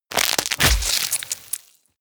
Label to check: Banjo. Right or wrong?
Wrong